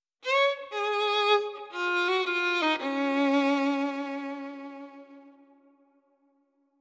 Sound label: sound logo romantic; d smart